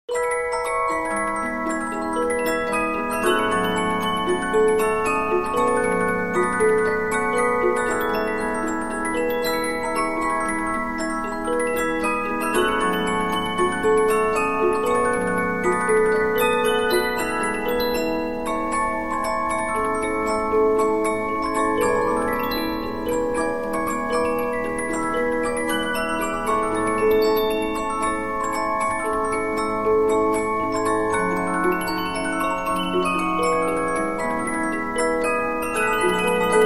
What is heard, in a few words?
Polyphon, 15inch disc musicbox